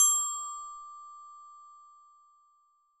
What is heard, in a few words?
glockenspiel chord D7